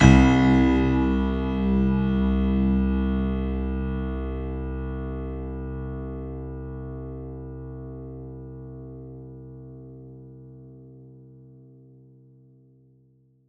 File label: C2, Synth Line; 90 s Sample CDs; NiceBeats; SynthLine VOL-15